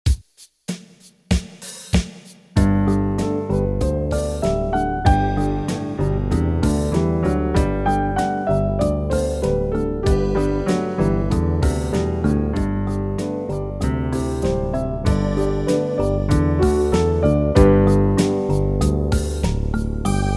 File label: Eighth Rest Study Slow, piano